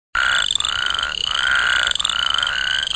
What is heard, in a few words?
spadefoot